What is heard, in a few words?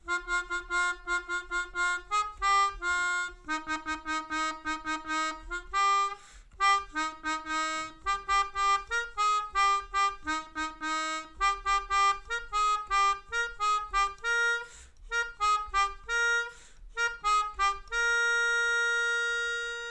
Come take my hand, Melodica